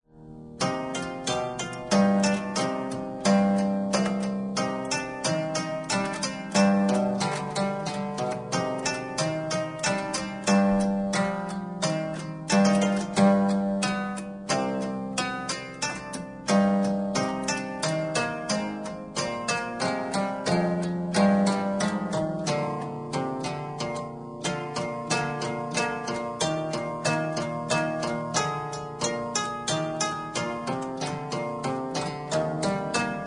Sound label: guitarpsichord